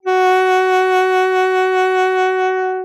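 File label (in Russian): саксофон одиночный долгий с вибрато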